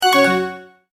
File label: multimedia tone, Goodbye; Tones (Sound Effects:Sound Design); McKinney Sound